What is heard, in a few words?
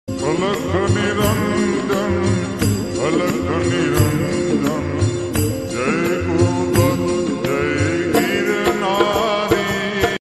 Alakh Niranjan Bhakti song